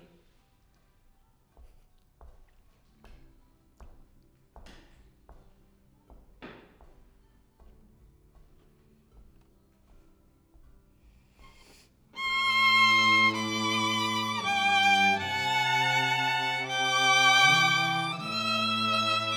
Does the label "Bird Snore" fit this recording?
No